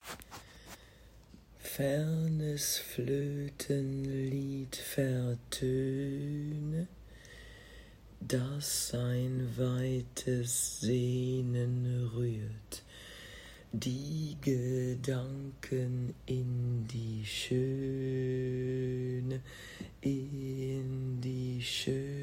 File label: Alto; Wald